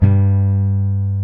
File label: Jazz Guitar